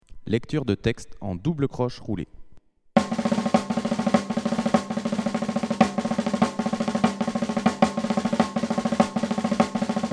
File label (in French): Doubles croches roulees